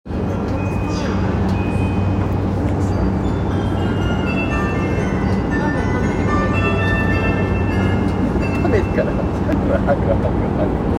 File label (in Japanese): 南北線白金台南, train sounds